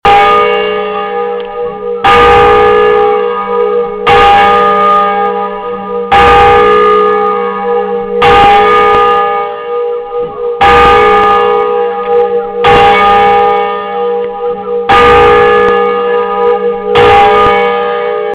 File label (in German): glocke; kleinlasseln